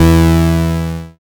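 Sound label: BAS MKS-50, bass, Roland; Sound & Vision - Gigapack II CD 1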